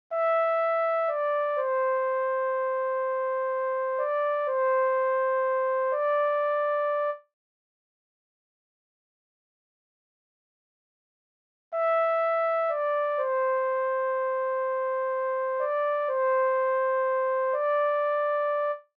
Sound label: euphonium